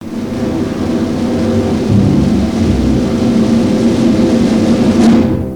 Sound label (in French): timbales chasseur